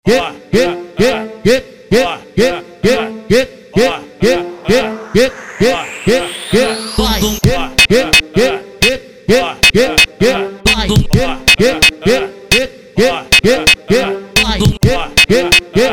beat passinho; dj kayky

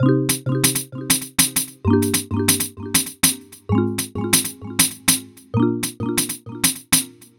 Beat Pandeiro; DF KIT

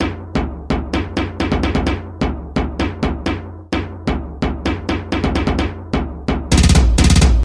Base tambor

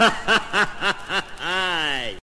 Risada

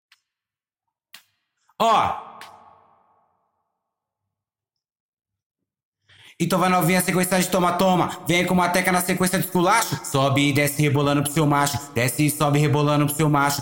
Acapella

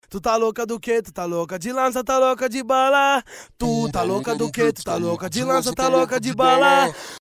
efeito

